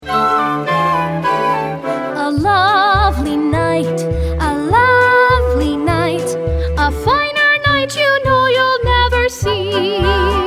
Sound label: Guided Vocals; Cinderella